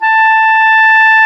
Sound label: Clarinet